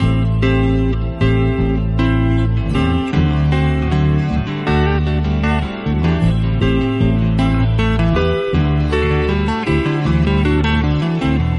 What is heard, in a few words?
guitar unpredictable, Loops, Phonky